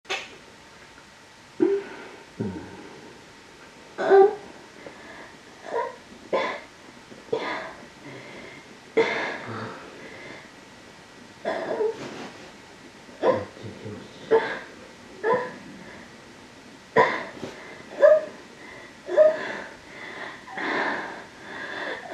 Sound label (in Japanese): えち音声